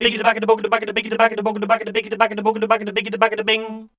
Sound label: SCAT A